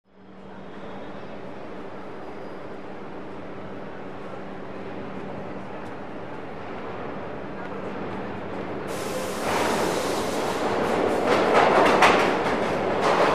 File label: Subway Platform Trai, subway